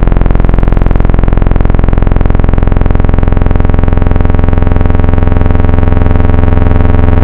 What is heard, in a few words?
vintage saw; Synthetic's Serum Collection; BVKER - Synthwave